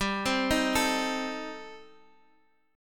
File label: A Diminished, chord; Adim/G; arpeggiated; JGuitar